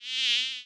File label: hornet attack